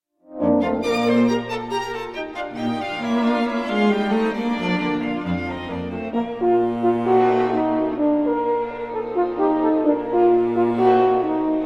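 sextet for 2 horns and string quartet op 81b in e flat major 1st movement; Ludwig van Beethoven; allegro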